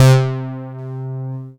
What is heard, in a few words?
BASS C3; R100M BASS; Best Service Dream Experience